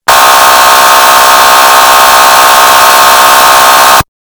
sos horn earrape

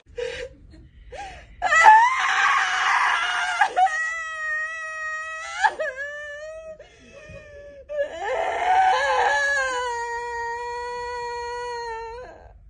biggest cry; gangles

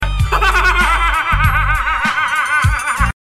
haha

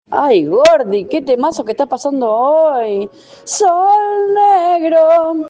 ssssssssss

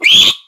screech; versace

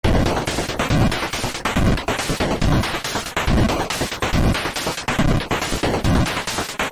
bit box

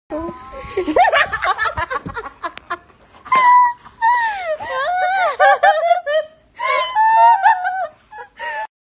hahhahha

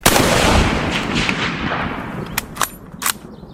mauser rifle

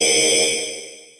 laser; sonic

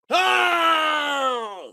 fall scream; mk9; sub-zero

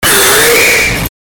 gag

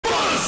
lower buzz

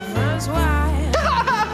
laugh

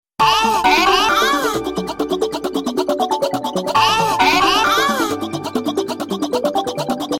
ringtone; floptropica

